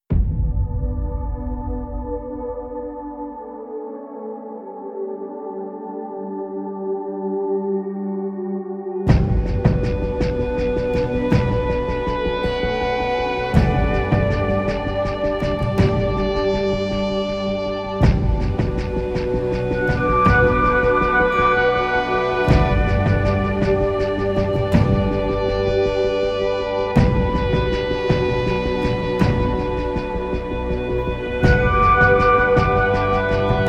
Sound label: no melody no perc